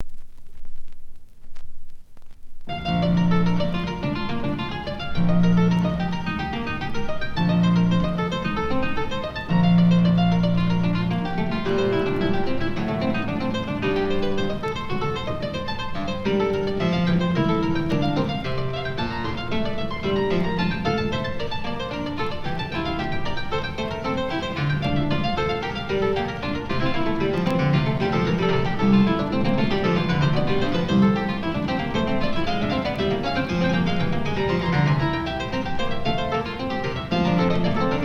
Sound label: Partita No.1 In B Flat Major, Allemande